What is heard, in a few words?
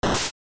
click; PPdastr Studio